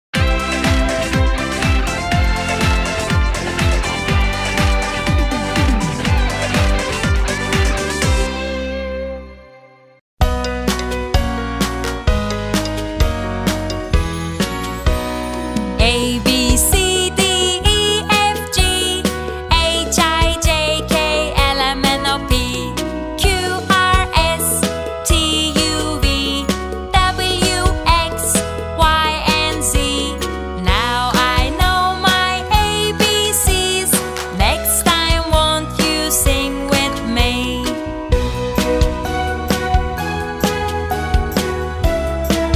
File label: ingilizce alfabe sarkisi abc alphabet song; alpi ve arkadaslari; cocuk